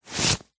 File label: foley clothing high vis jacket thick hand remove from pocket